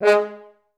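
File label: French horn staccato G#2, Advanced Orchestra; Best Service; Peter Siedlaczek's Advanced Orchestra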